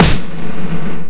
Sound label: Snare, E0; Guitar Rock Tour